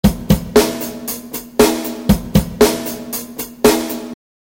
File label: drumloop